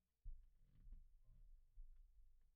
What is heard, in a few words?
Kick In